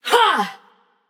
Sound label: grunt attack passion; chaac